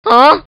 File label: "huh"